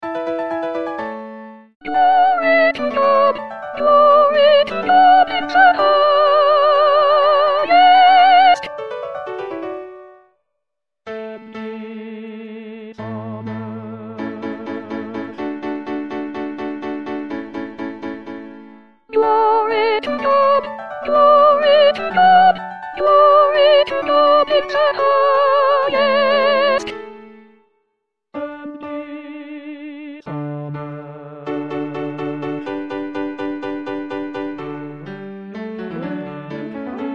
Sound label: Soprano; Handel; Messiah; Glory to God